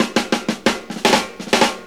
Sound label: DRMROLL; E-Lab; X Static Goldmine 2; akai